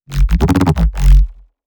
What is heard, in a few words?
Neuro Bass